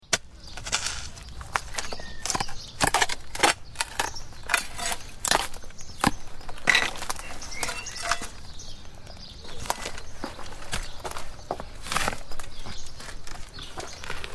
Digging hard and stony ground with a spade in summer; Hand Tools